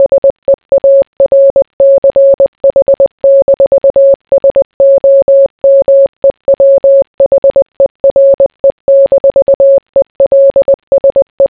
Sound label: morse; Forensics